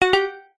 multimedia ui tone short bright organic generic notification